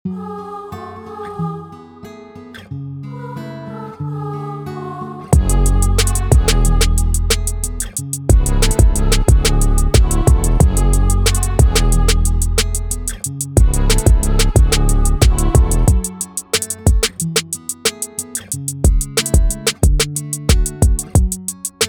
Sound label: acoustic bpm 182